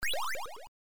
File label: throw confetti